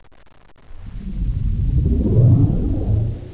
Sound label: attack; svencoop; schall